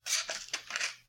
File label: shuffling papers